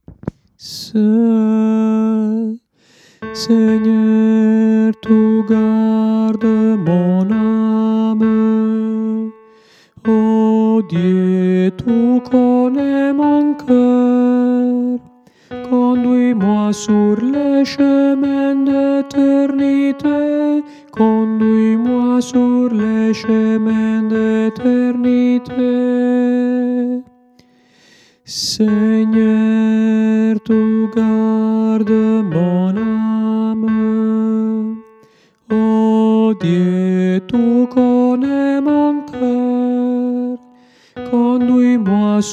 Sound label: tenor